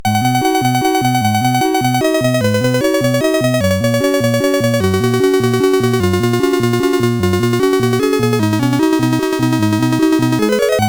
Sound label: Soundwave Tubular Bells